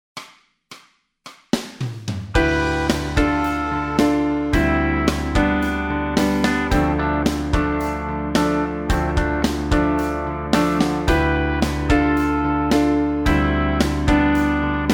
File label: bass scales, backing tracks